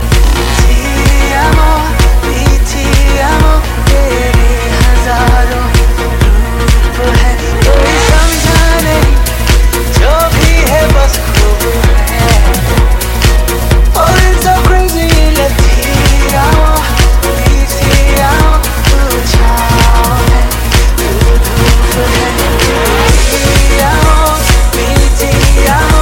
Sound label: Jazz Remix